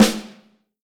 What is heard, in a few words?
Vintage Snare Drum, 6.5X14